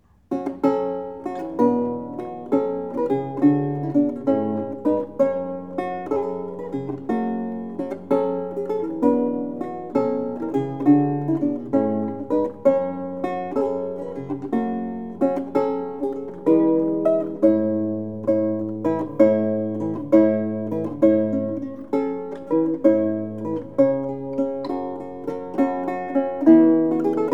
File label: Jig; Dowland - The Collected Works, Anthony Rooley; Tarleton's Jig